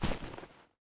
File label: earth, human step; earth3; Soundscape Overhaul